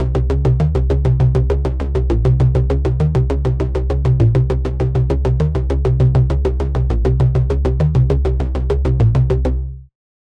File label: Bass; Devour Magic; Routed Sound; Serum 2 Expansion - Doom Carpenter Vol.1